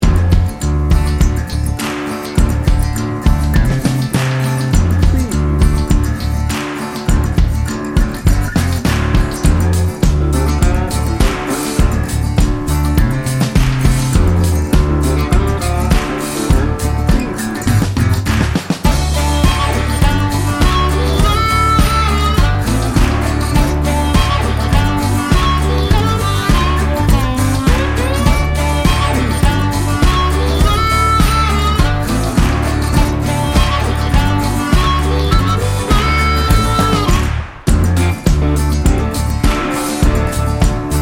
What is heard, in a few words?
swamp boogie